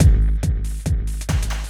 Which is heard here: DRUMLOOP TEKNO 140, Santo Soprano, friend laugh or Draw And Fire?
DRUMLOOP TEKNO 140